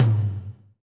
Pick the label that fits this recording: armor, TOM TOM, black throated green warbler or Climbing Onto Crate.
TOM TOM